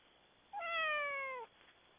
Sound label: cat meow